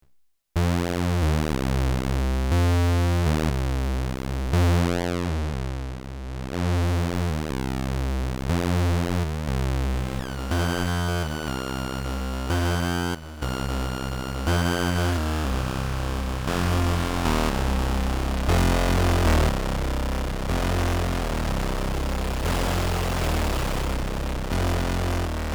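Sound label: System 1m sync mix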